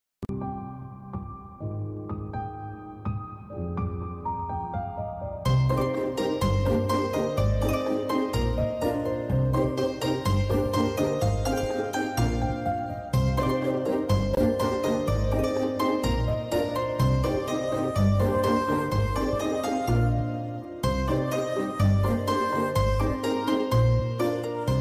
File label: romantic bgm music and ringtone; Guduputani; Sapthagiri